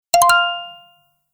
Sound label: Electro, notifications; BlindShell; BlindShell-Classic-2